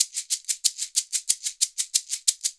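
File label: shaker low, percussion; ILIO; Synclavier Percussion 2; World & Orchestral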